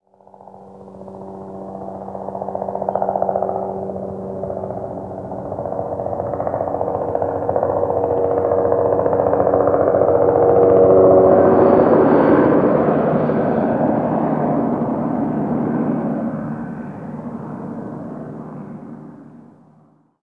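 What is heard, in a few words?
helicopter flyover stereo; amb elements; modern warfare 2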